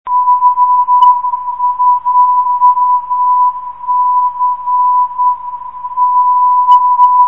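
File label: 396 kHz, non-directional beacon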